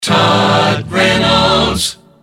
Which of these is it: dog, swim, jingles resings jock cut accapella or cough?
jingles resings jock cut accapella